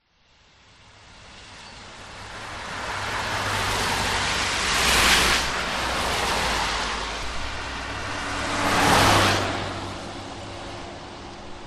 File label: vehicles traffic wet road pass 60kph main road not busy